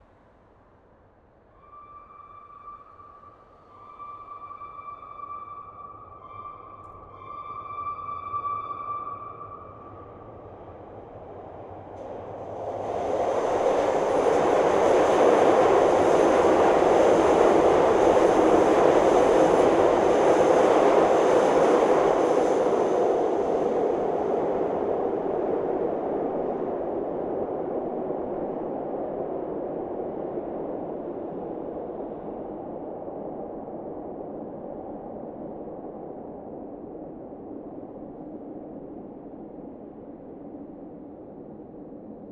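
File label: L TRAIN by with alert horn Underground sidewalk grate Perspective, Chicago Rapid Transit; Griffin Sound Design; The L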